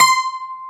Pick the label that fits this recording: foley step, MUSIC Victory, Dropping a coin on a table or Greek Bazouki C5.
Greek Bazouki C5